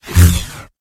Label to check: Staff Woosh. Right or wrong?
Right